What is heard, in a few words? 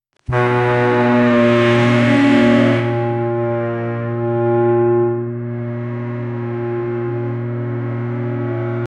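interceptor car; mad max; apocalyptic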